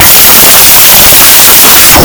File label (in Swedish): bumling ljud